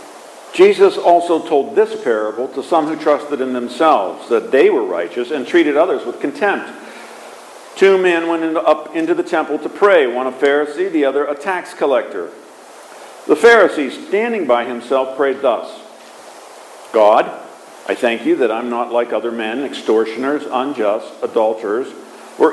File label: Gospel